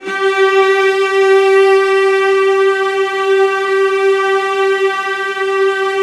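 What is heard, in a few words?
VIOLAS A4, Strings; ILIO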